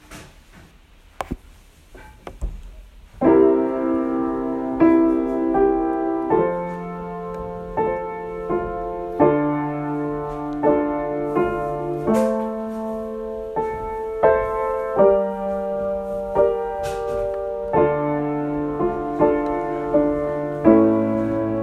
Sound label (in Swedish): gläns över sjö och strand instrumental